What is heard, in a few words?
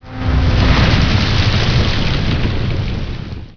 drill dig; mcv; Emperor - Battle for Dune